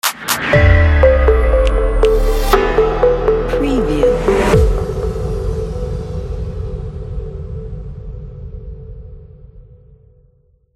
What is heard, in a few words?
simple logo